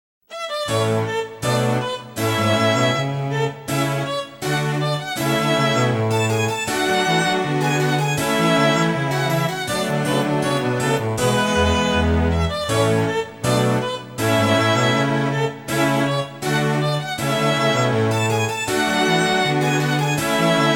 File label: Music Classic Theme